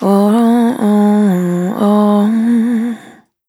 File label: Spin Dry, Single Voice Melodic Loops